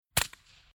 Sport Shooting Gun Hunting Small 22 Rifle Single Shot Light Reverb; Rifle Sound Effects